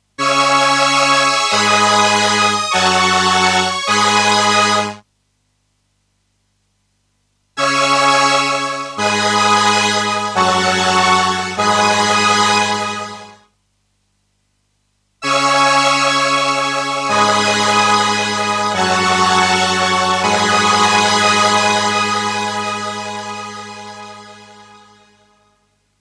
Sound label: Behringer Solina Sustain